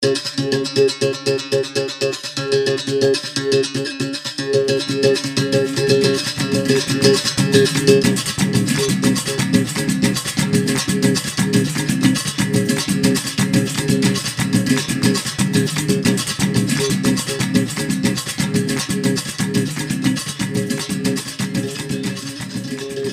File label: Capoeira